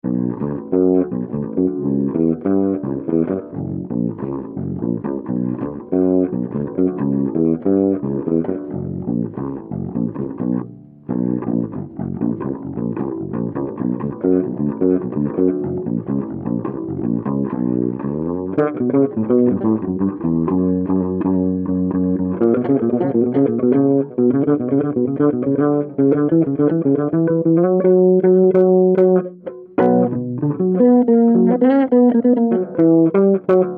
vibe salseira improv instrum contrabaixo audio sem mixagem

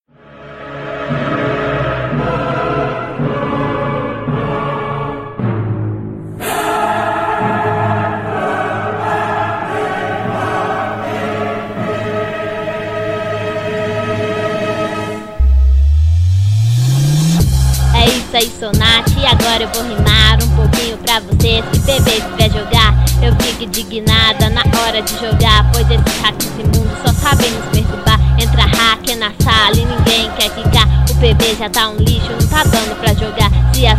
pista de rap para improvisar

